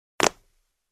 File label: Jump on the marble floor with shoes; steps